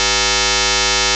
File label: ELECTRIX